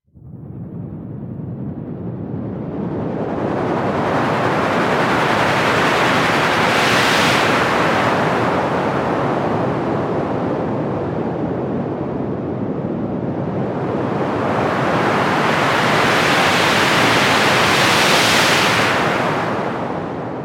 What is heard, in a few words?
White Noise